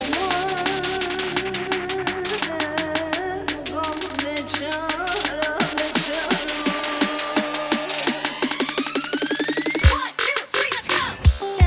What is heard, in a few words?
Turkish Trap